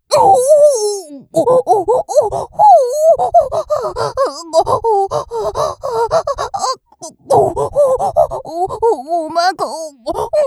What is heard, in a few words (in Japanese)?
んおぉっ おっ おっ ほぉっ